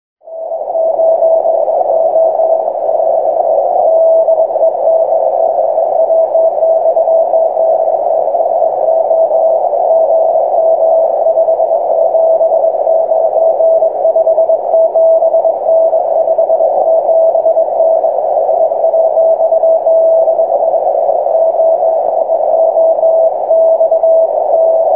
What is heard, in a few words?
80m, vertical; HAM-radio